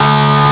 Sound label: DIE; pang